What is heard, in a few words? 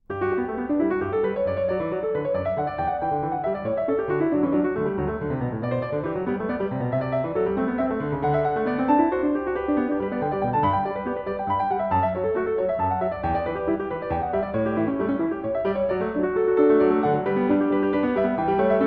Goldberg Variations, Variation 1 a 1 Clav.; Bach; Zenph Re-performance; BWV 988